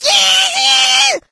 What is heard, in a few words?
rage at victim, infected female